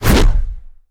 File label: Whoosh Arm; Evolve; Meteor Goliath